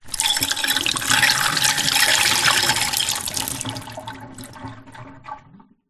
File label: household kettle modern water pour out into sink; zapsplat